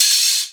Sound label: Open Hihat; Vengeance Samples; VEE Cymbals